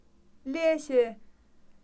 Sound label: yawn; Kham